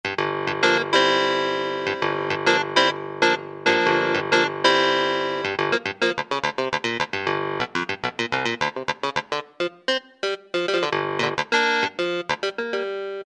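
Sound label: Classic Clav, Alesis Z4 Vintage Keyboards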